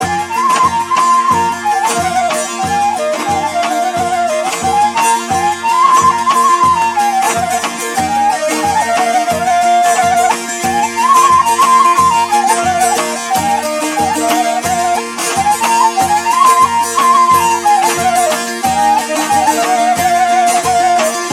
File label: steady 90bpm melody kaval; Narodno